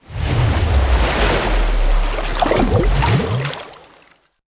cast water; casting; psfx

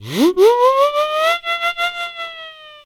comedy siren whistle fun sounds; sonniss gdc

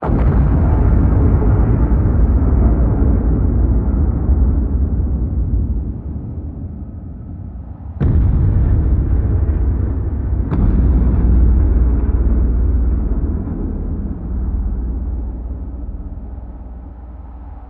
space explosions; Sonniss GDC

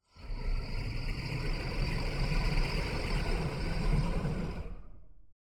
dragon roaring; fvtt sonniss gdc audio

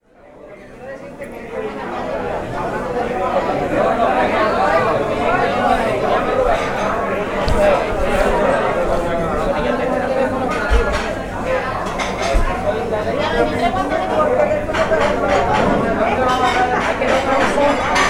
chatter, tavern; Loops

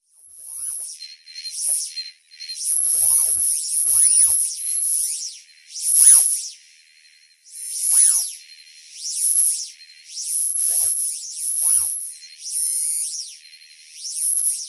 ambience; bluezone; fvtt sonniss gdc audio

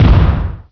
knuckle hit; lancer weapon fx

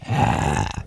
zombie, Monsters; Creatures; Monster Roars; Monster Roar 2

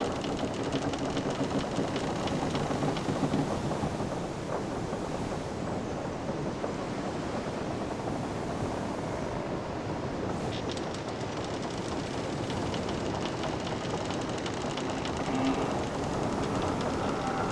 ambiance aboard a drakkar

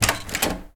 door open; sonniss gdc

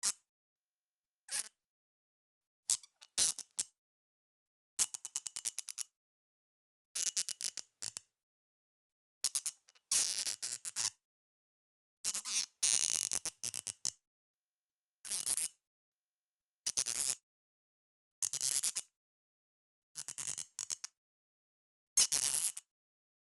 squeaks and creaks bottle cork squeaks; squeaks and creaks two; ntg3

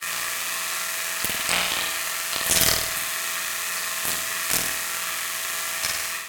electrical current mouth noises; Sonniss GDC

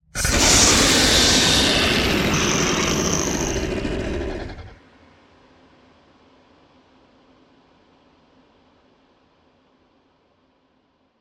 creature combo; fvtt sonniss gdc audio

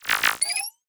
Tech Prepare, weapon fx; lancer weapon fx

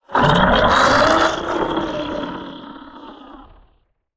monster death; monsterpack2; Sonniss GDC

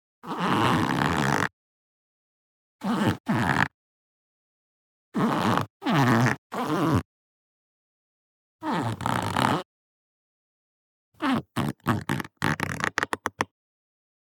cardboard box creak small

